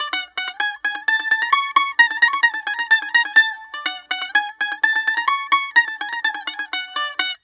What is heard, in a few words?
BAGLAM D, Mediteranean